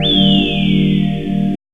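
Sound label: synth whistle; X-Static Goldmine 1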